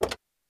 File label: click